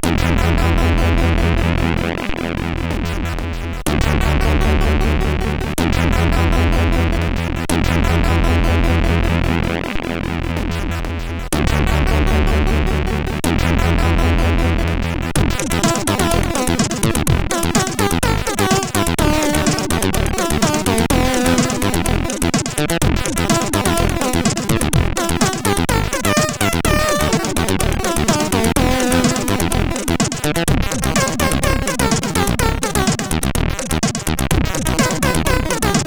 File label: Short Zak, CSG8580R5